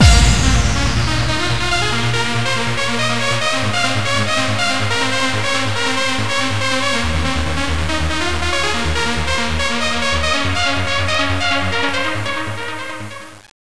rave

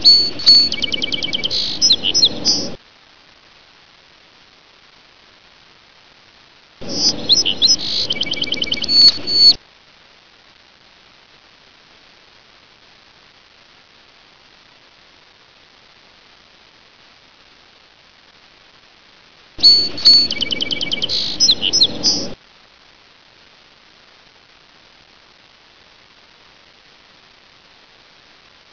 bird

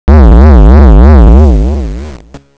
robot; breakfree3

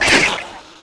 attack, facehugger; svencoop; aliens

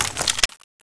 sniper deploy, weapons; svencoop

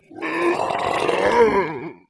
zombie voice idle

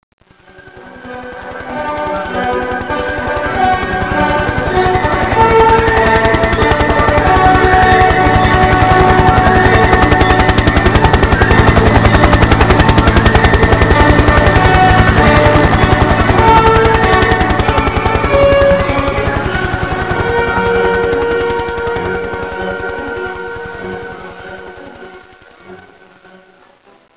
medevac, ambience; firearms

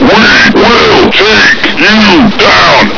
cheer; svencoop; voice commands; Grunt

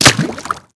slosh; svencoop

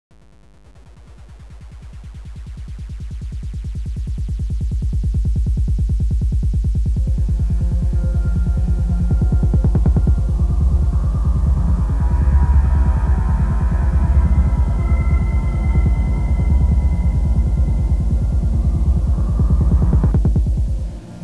city; svencoop

